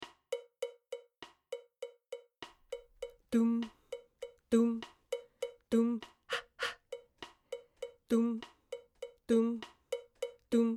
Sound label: Jingle Bells mezzalto